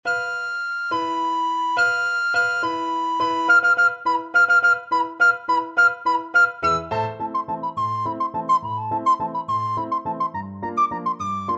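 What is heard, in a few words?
Danse Mac Saint Saens recorder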